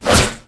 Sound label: Cosh Swipe, Electric Cosh